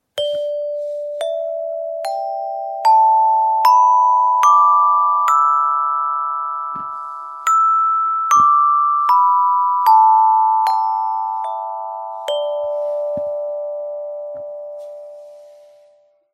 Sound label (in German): Glockenspiel Carillon pentatonisch; Choroi